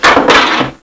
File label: lever